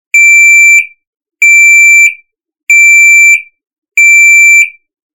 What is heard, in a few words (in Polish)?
ton